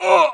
hit, pain; mohaa

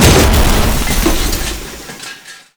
Jeep; vehicle; Second Jeep; mohaa

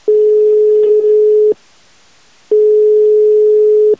phone tone; xash3d fwgs; induction

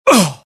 death, pain; dialogue; IT; mohaa

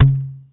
bottle pop; cdogs

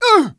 death; mohaa; dialogue

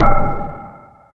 lever; scummvm; The Griffon Legend

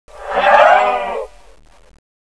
alert; xash3d fwgs; controller

